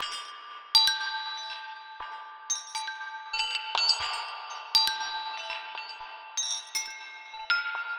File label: Color Xylophone Loop, Ethnic Instruments; Sonic Foundry (Sony Creative Software); The Electronic Music Manuscript Collection